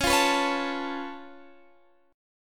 C sharp Augmented 7th C sharp down Guitar Standard, chord; C#+7; strummed; JGuitar